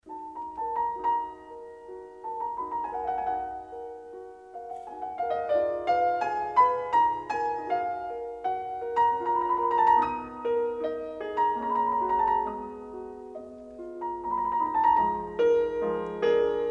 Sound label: Variations for Cello and Piano